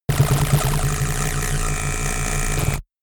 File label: space charged laser blaster unsteady hard